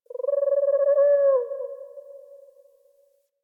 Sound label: Night Zone Tree Critters; Warframe; Ambience; Eidolon Plains; One Offs